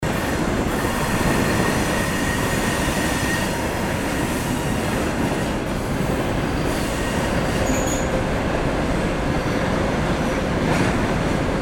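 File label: sound of railway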